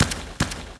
Fighter Run; Marlone

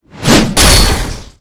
Novice Attack; Seer; GamigoNA

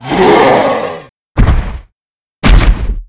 Yeti Die; Smart

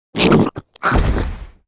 King Slime Die

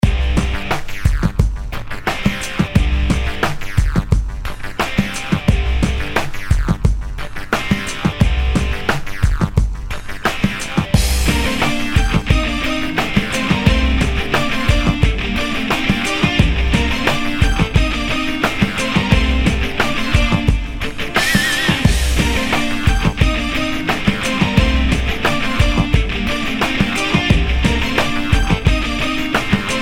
BGM Dungeon

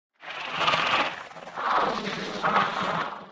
Time Attack Stand

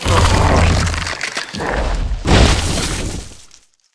Jewel Tree Die; Seer; GamigoNA

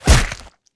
Mantrap Atk; Seer; GamigoNA

